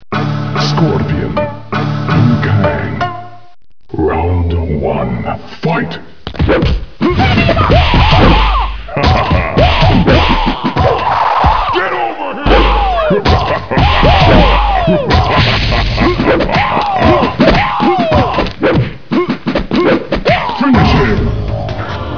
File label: fight, ambience; mkfight